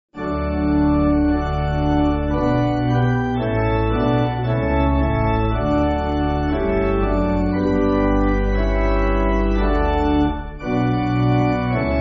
Pipe Organ, church music

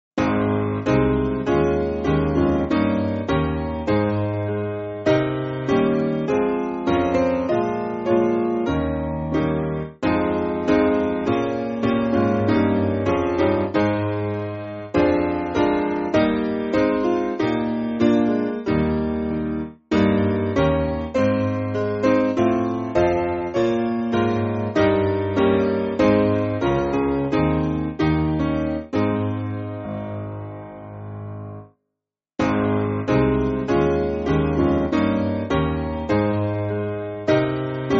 Piano, church music; For The Beauty; Noricum

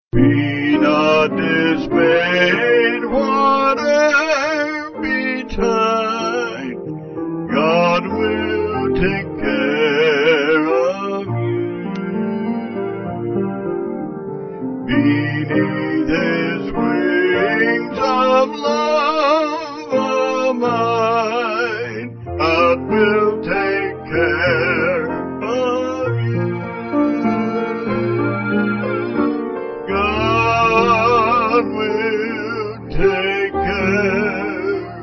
Vocals Piano; God Will Take Care Of You

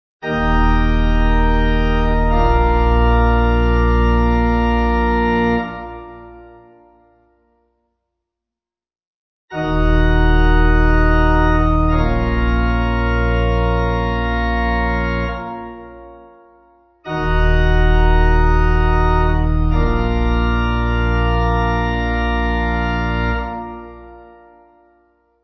amens simple Bb pipe

